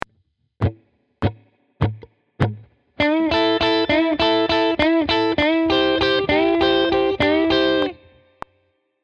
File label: blues, guitar